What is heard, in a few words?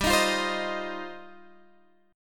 F 7th Suspended 2nd G